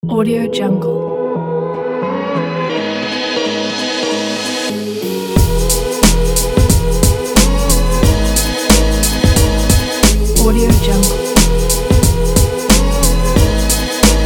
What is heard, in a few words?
hiphop